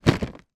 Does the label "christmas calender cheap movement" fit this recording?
Yes